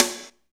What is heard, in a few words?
snare digi; Roland L-CD701; Snares 3; Sn Modules 3